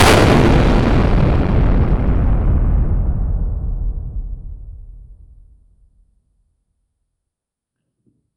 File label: giant brain explodes, gore